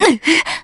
ngh; virgilia

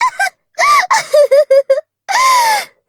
laugh; nemu

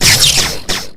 fire loop

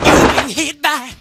jumpin; Hazama

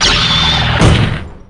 head bang; evil manfred

